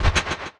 Hat; Touch Loops; BlissedOutBeats; One Shots; Drums

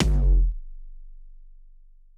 C1 Tuned Kick; Touch Loops; ElementsOne; Abstract Rhythms

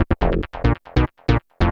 SNTH BASS ELCTR 140 A, Techno

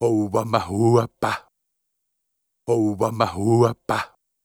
ETHNO EXTRA 90; ethno2

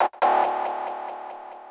KEY SHOT TEKNO 140 A; Techno2; Singleshots

